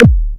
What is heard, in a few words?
BBASE, DRUMS PERC; Q Up Arts; Dream Experience